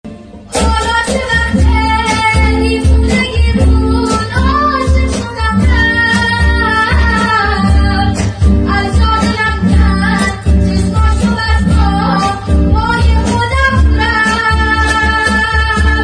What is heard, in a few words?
Guitar Version